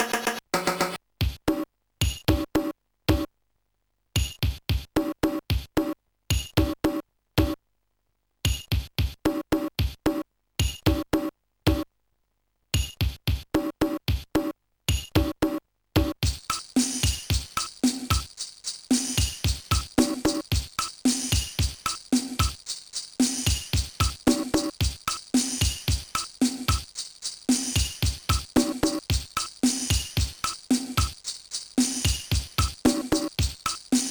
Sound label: Latin, Dynamic Drums